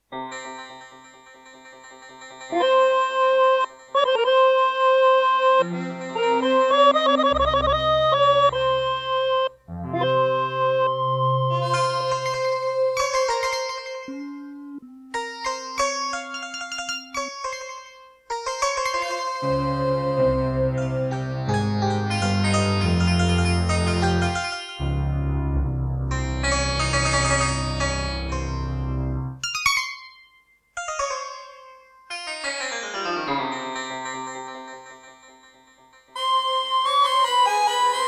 Serdaco Crystal Blaster C1; Prince of Persia 2 - Intro

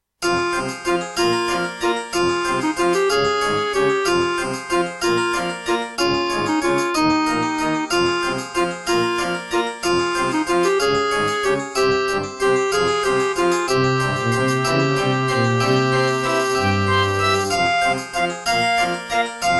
Serdaco Crystal Blaster C1; Death Gate

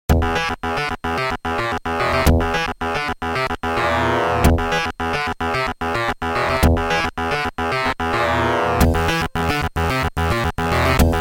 Creative SB16; Doom

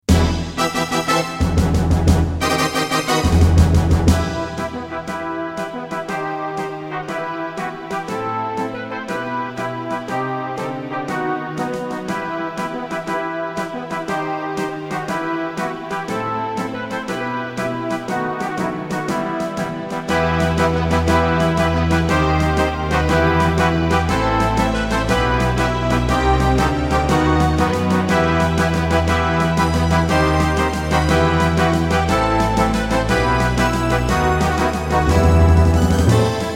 Terratec MiniWaveSystem WT64; Warcraft II - Human2

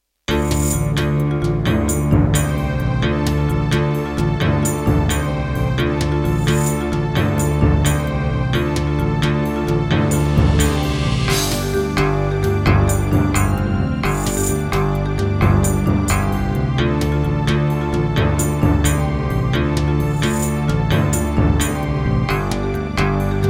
NEC XR385 DB60XG Clone GS MODE, wavetable; Heretic

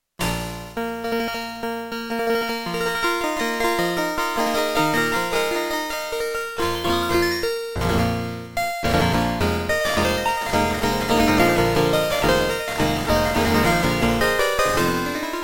Beepblaster 2.0 BEEPMODE; Dark Forces

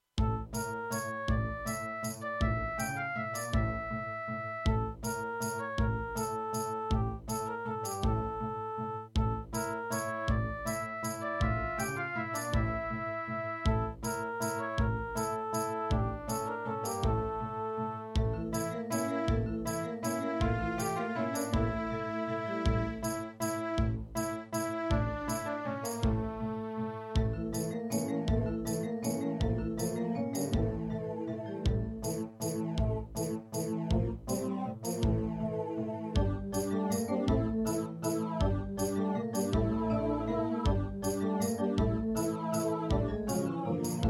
Labway LWHA111800 (AdMos QS700)

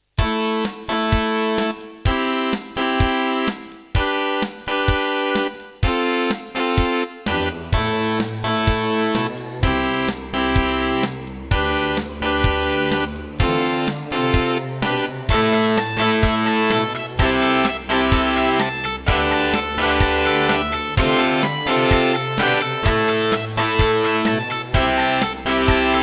Wave 1, Alesis NanoSynth; Raptor

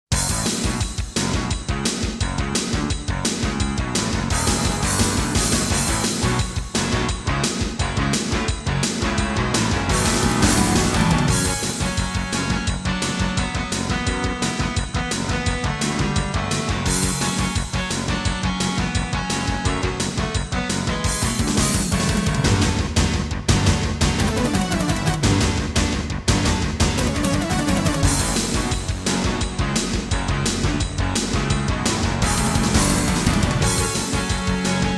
Intro, Roland SC-7; Duke Nukem 3D

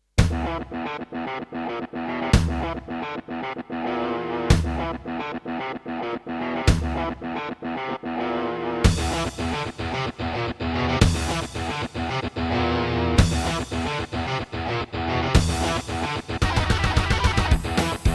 Roland SC-88 Pro 88MAP; Doom

